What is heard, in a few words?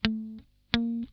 NOTE SCALE, Funk Guitar; 90 s Sample CDs; Zero G